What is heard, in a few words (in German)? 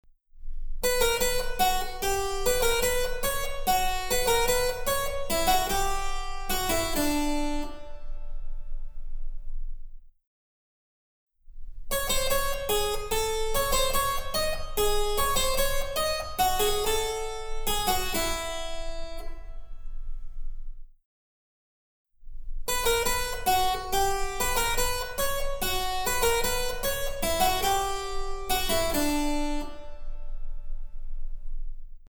Cembalo; Mark Lindley WTC 1